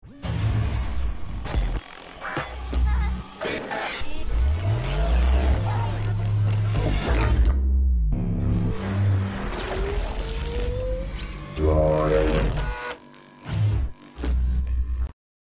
Extended Droid Sms

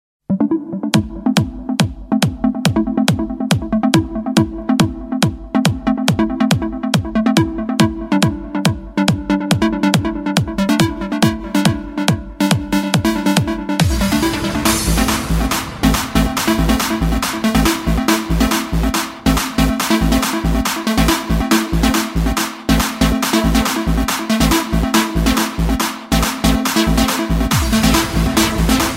Tranceformation

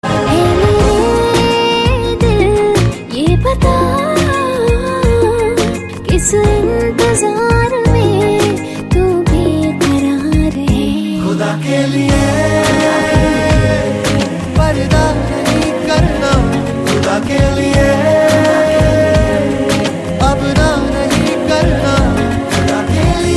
Femail; Khuda Ke Liye

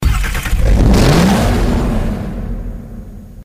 Audi Tt; Nfs-hp 2010

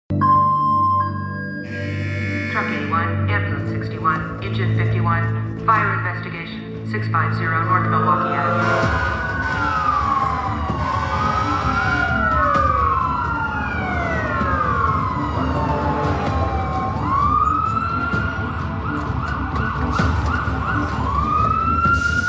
Chicago Fire Alarm

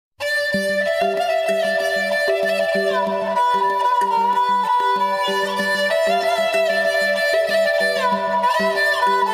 Sms voilin

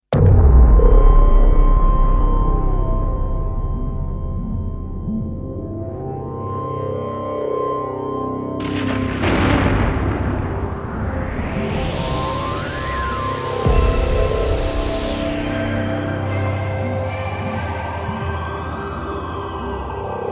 Hip Hop Love Song

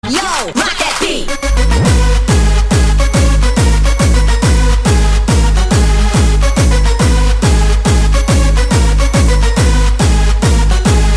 Techno

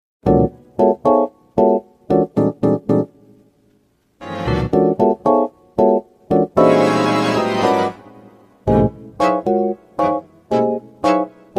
Music G Major; Mii

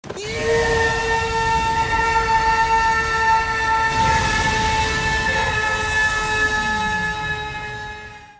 YEEEAAHHHH

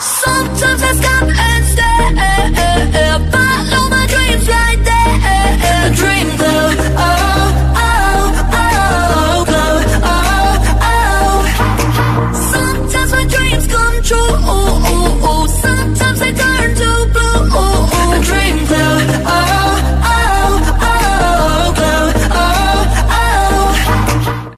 Dream Glow Ringtone

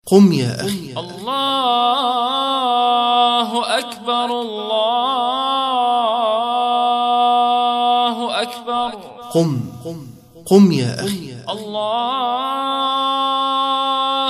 Islamic Alert

